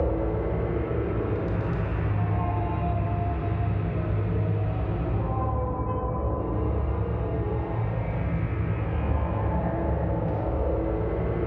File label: cyb arc furnace phase; Stellaris; machineage; superstructures